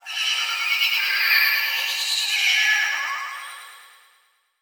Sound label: Transition Delay Medium; Production Element Title Transition